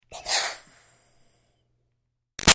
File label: Steam Release Iron Chuff Doppler; Miscellaneous Household Sound Effects; BigRoomSound